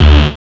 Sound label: SYN, Jungle; E-Lab; XTC Files of Jungle